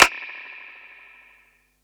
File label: clap; eddyflux; dough-samples